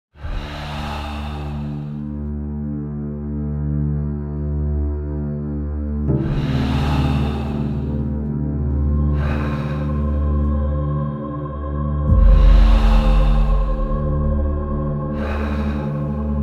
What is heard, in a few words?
Stolen Breath